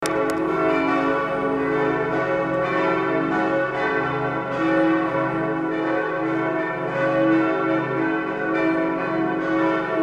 Johanniskirche Glocken

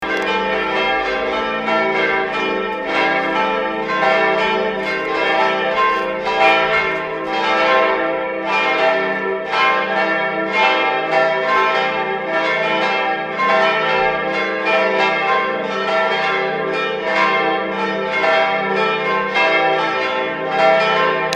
Kreuzkirche Glocken

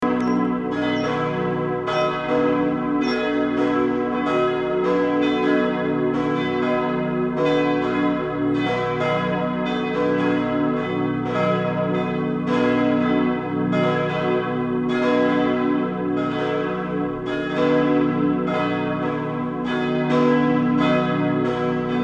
Marienkirche Glocken

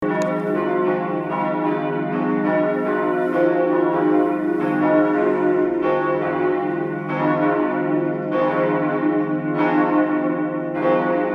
bommern glocken

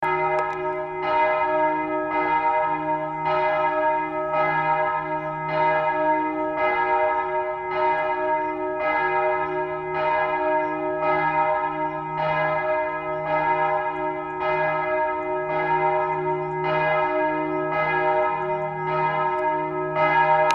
Hauptfriedhof Glocke

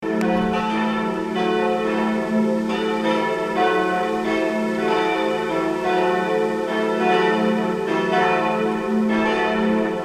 Vinzenzkirche Glocken

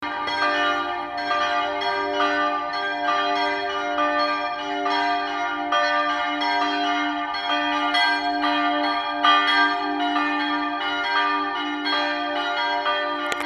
Maximilian Kolbe Glocken

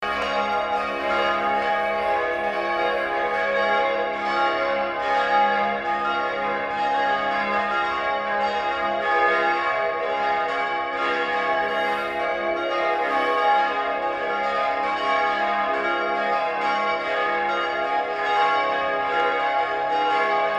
Lukaszentrum Glocken

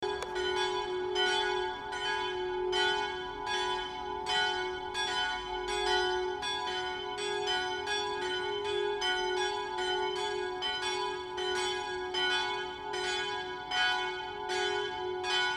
Martin Luther Glocken

